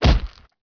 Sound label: gen hit; Revista CD Expert 45; ZEUS